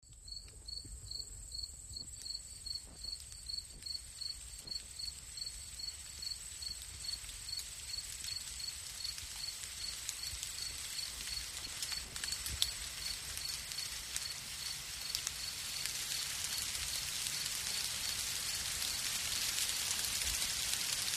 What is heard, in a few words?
Summer Rain Shower Fr